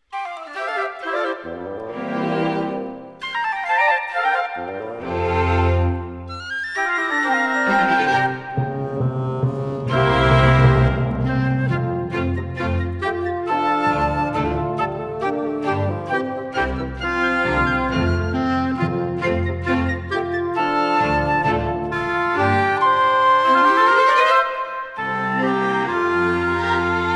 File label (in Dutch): instrumentaal; MuZiDo